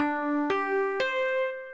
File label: Send Sms